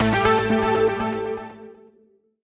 bigwin; Sizzling 777; wazdan

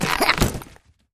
penguin fishing; Fishing For Gold

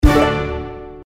scatter 3; Horoscope

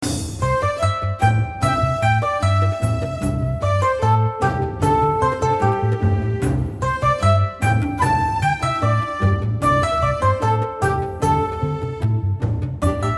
freespin melody; Dragons Gift

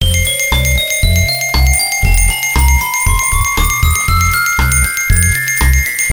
win count up, keno; games; Bingo

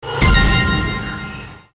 nicewin; Bells On Fire Rombo; slot

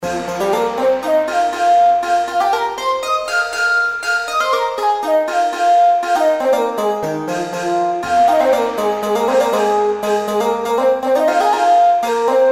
lotuslamp outro bgm

